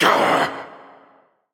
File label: Grunt Hit; Makoa; Paladins